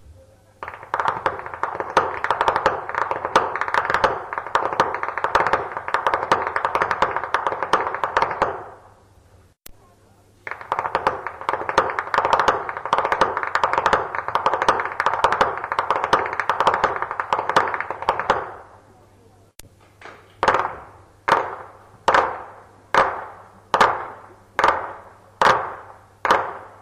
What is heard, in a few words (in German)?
schnalzen; saaldorf